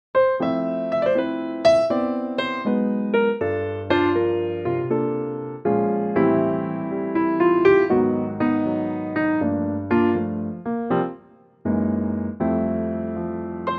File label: F Piano